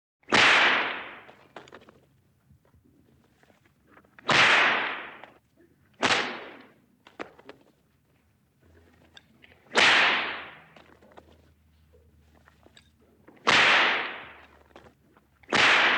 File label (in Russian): knut dressirovschika